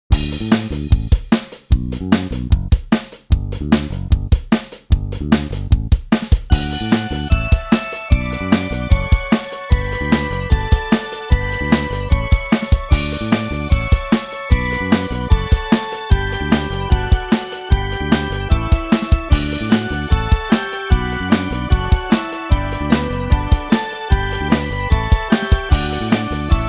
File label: canon pop